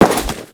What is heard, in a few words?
Crewman Step Default, Footsteps; Warframe; Corpus